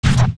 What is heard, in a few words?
Fall Down Sand; Link